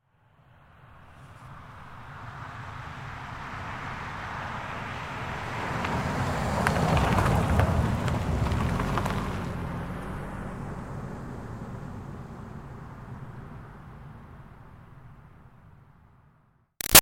Car Highlander By Corner Dirt Slow; 2005 Toyota Highlander V6; Cars; Vehicles; Big Room Sound

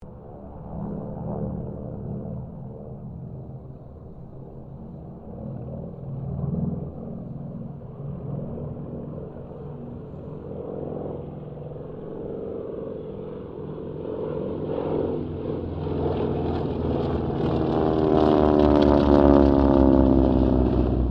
AIRCRAFT CT4 WINJEEL WIRRAWAY HARVARD PASS

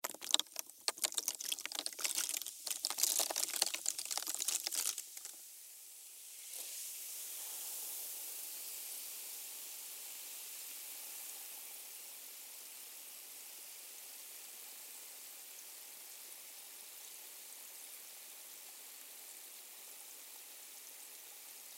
Vinegar Pour Baking Soda Mix Fizz; Vinegar Pour Splatter Baking Soda Mix Fizz; Fizz Sound Effects; Blastwave; Foley

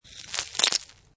Snow And Ice Bits Of Ice Falling On Ice; Ice Sound Effects; Ice (Sound Effects:Weather)

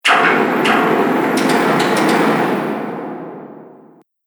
Strong And Mighty Impact; Miscellaneous Impact Sound Effects; Explosion

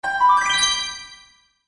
Magic Spell Bright Twinkle

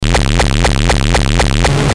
GLITCH STUTTER, Glitch Stutter Electrical Buzz; Production Element Imaging Element Accent Transition; Blastwave

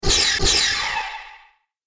Ion Blaster Double Shot, Laser Gun Sound Effects

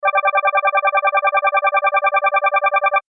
Collect Blips Fast, video game sound effects

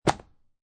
Foley, Book, Hardcover, Dropped Book On Carpet; Drops

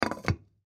Jar Lid Close Porcelain; Container; Food; Household; Foley; Blastwave

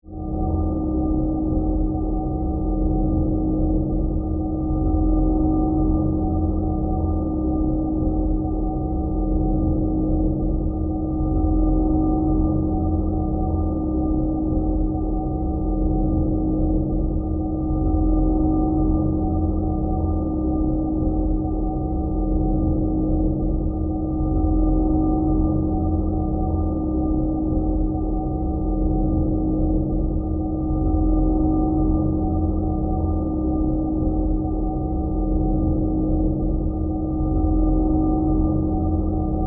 Power Source Magnetic, Scifi, Field, Hum; Spacecraft; BlastWave

